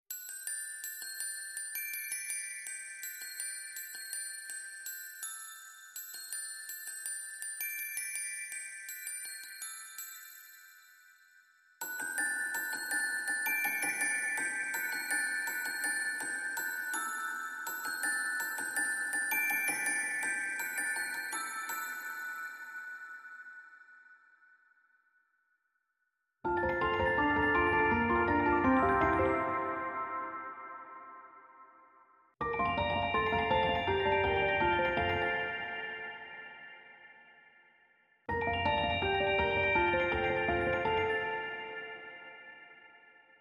Bells and Piano

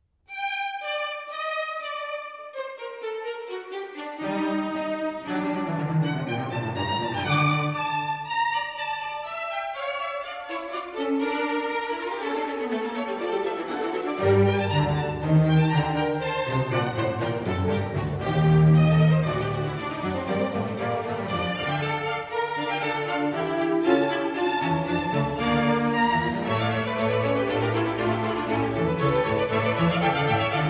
Concerto for Strings in G minor; RV152; Antonio Vivaldi

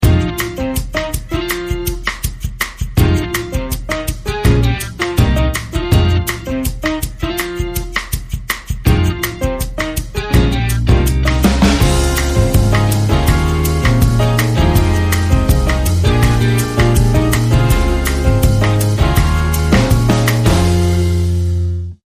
Jazz

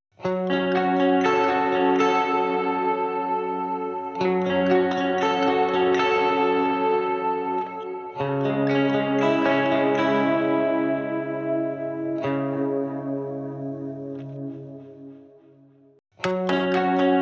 Obvious Conclusions (Neutral), No Lead

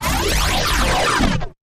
Hollow Distorted Sweep

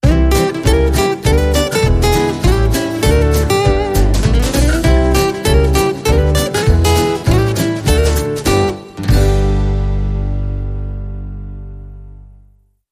Country, Jingles

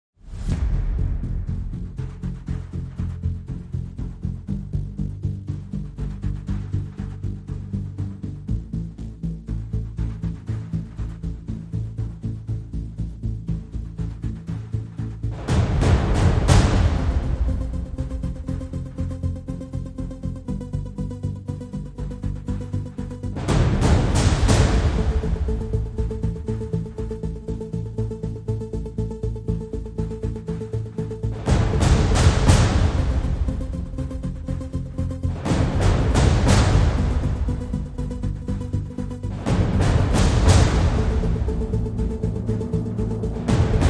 Drums and Synths

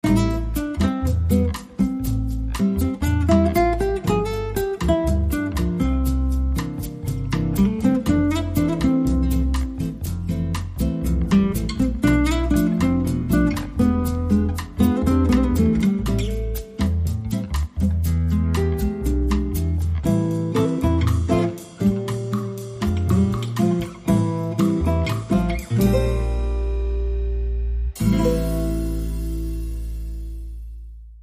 Bossa Romantico

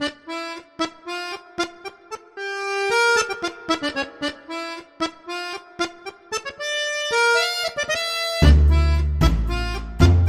Tango

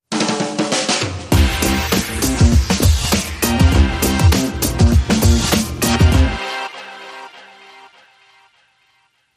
Hammond, Sting

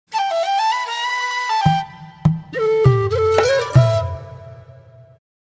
Whistle Bowl

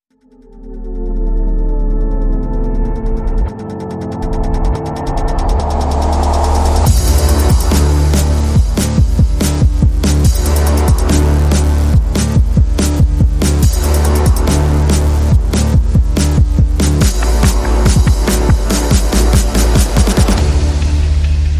Bass Perc and FX Only

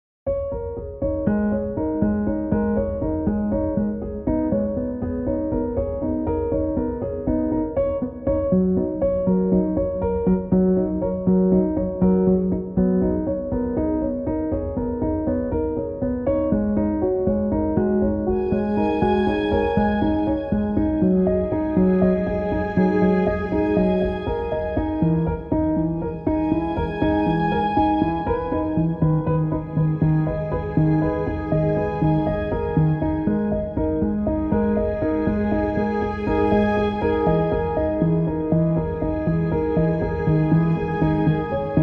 Sombre

